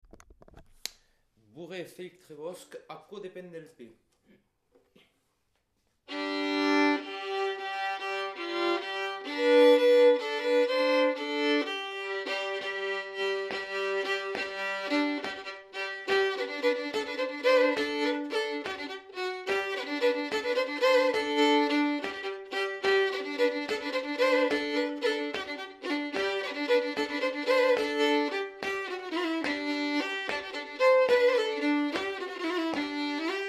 Bourrée (vitesse danse); Aquo depend del pè

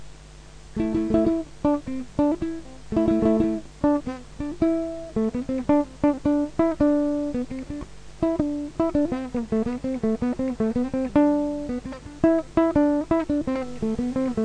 chapeloise à 3 voix; Jules

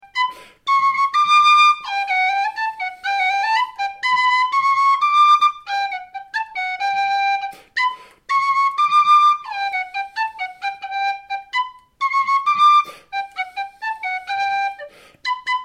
farandole du grand père

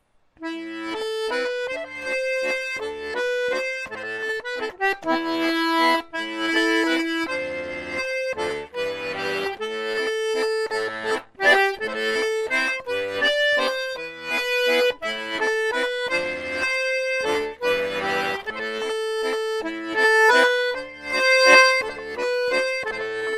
Quand je suis à ma table ronde (version diato confirmé)

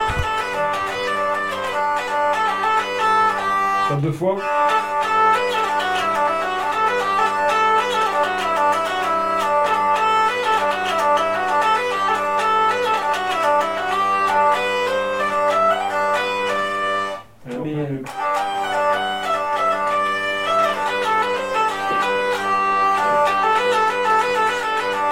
Scottish de Roumanie

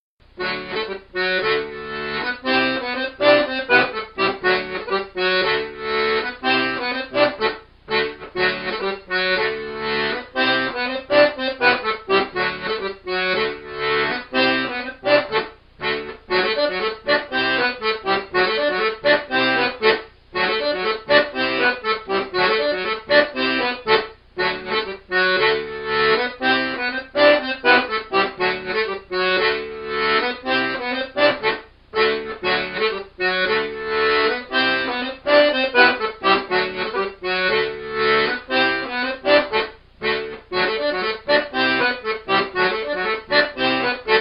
bourrée 2 tps; avant de s'en aller

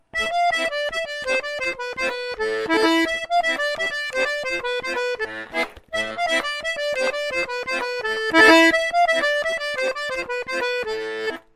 La filha d'un paure òme (accompagnement n°2)

